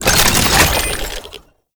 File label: Concrete Archgun Reload; Warframe; Archwing